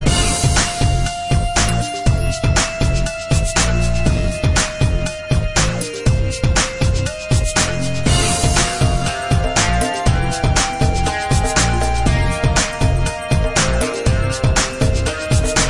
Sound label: Music Menu; windows gamerip 2006